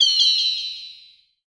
Bird Pan; FREE SFX PACK VOL 1

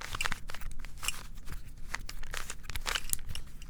Big Cube - Twist, Rubik's Cube

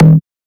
Error Buzz; FREE SFX PACK VOL 2

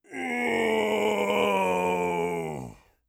death, orc voice; Orc Voice Pack

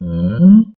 grunt; CC0 creature sfx 2

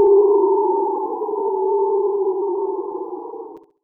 Retro Cinematic Wind

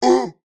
hurt, creature

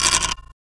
Dice Throw; FREE SFX PACK VOL 1

